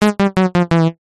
multimedia game sound basic digital retro incorrect error negative